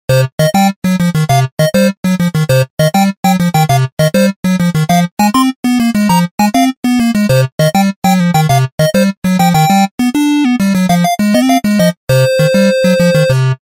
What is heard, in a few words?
8bits ringtone; toques para celular; spider man